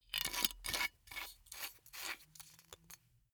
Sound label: glass bottle close aluminium lid